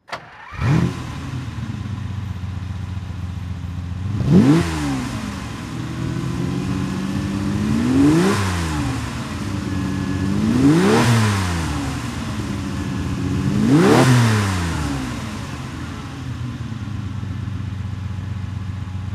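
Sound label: ferrari 250 gte throttle, engine sound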